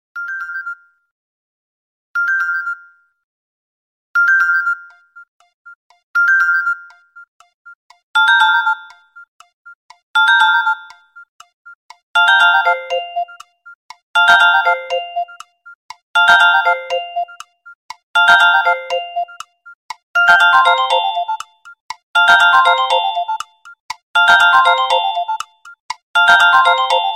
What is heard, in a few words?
Cute Nokia Clock; Ringtones